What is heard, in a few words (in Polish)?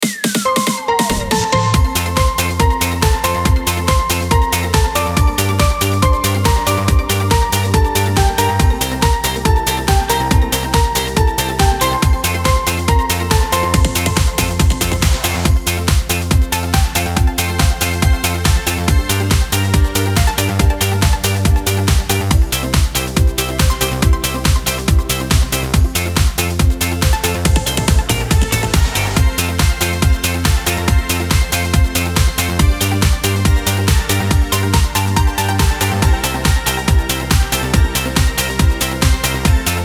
karaoke